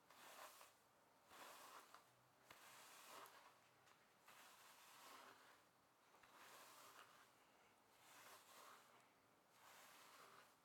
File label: Human Combing Hair